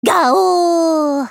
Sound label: lasgun; warhammer 40k